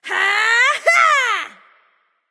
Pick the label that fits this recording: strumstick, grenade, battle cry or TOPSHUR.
battle cry